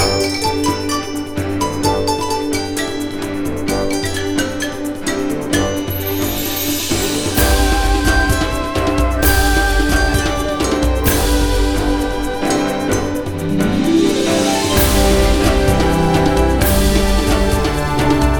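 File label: Music Gameplay Valentine Loop; Rakoo & Friends; Wii U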